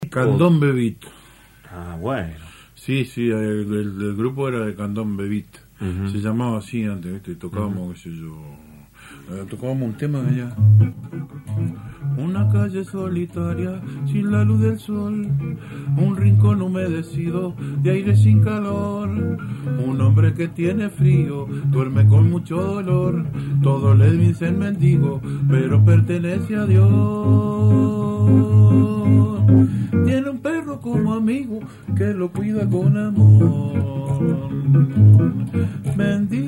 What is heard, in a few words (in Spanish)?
candombe beat